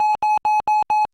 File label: System MSG; LG CU400 Cingular; AIM; IMTone